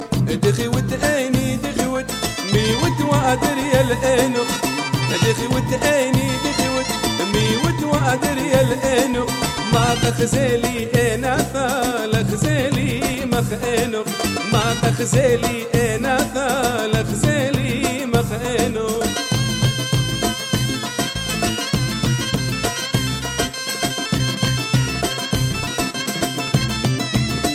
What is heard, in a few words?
Iraqi Style